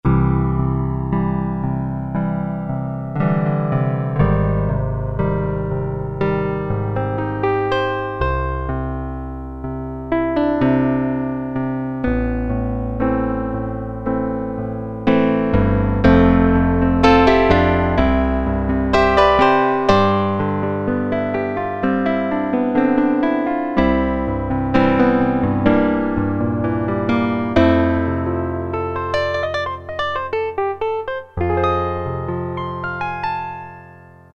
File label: Piano 2, Roland Alpha Juno-2; Factory A